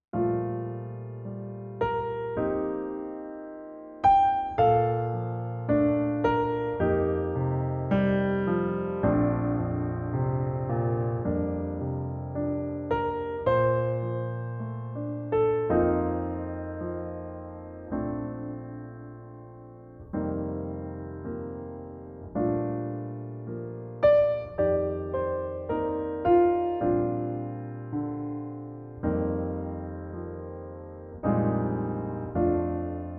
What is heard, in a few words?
Bb piano trak